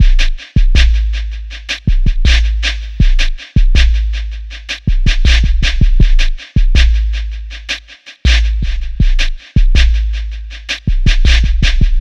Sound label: Just Base A, ambient; ambient vol 2